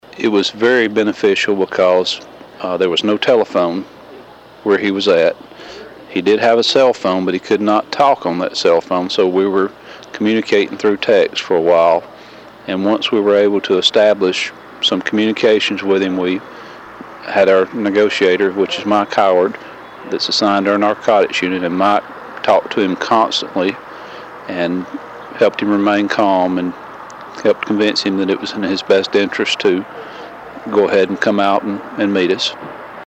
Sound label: loudspeaker phone unit